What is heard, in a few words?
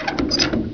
lever; hl2; buttons